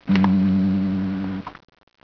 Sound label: door